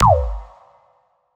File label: MENU Back; sightwords